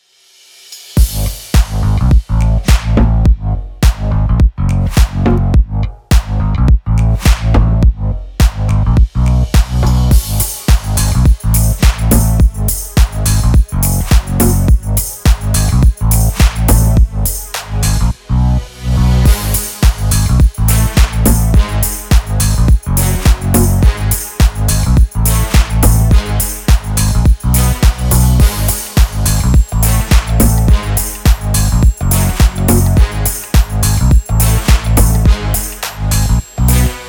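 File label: guitar bass house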